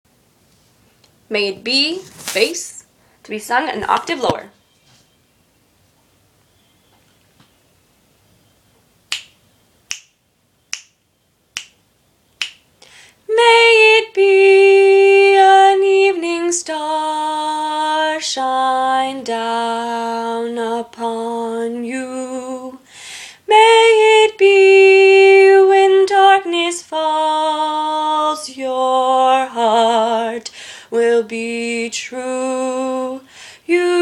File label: Vocal Bass